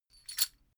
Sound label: metal chain movement single